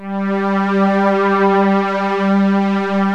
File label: MIDI STRINGS